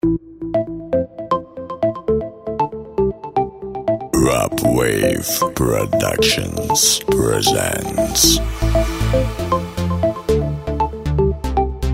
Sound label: beat